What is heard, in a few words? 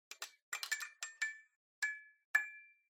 Fluorescent Light Noise Crackle